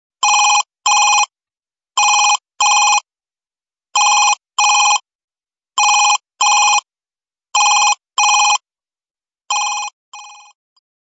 office phone

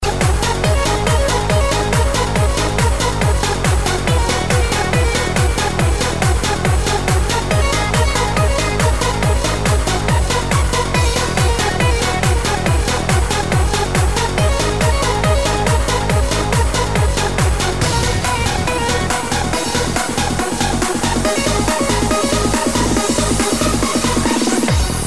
Future Trance

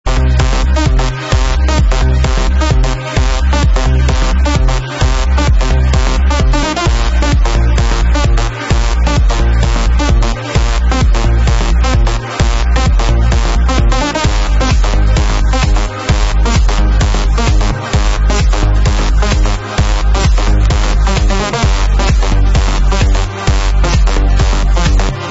Trance Progressive Remix

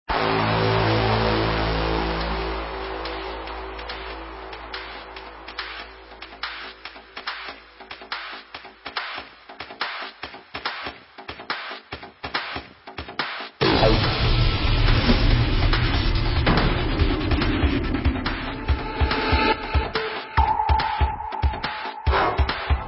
drums at dawns; Progressive Trance; Label: YSE

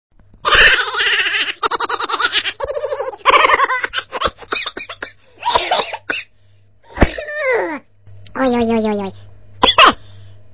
Haha

